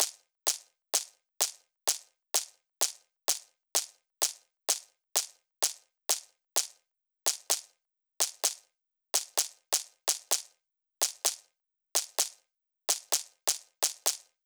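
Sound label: Drum Loop 128 BPM bass drum; VEC5 Multi Drum Loop 50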